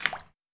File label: Puddle